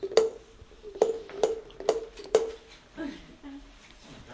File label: blonking and laughing; Sound FX Real Life